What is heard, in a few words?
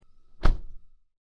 door shut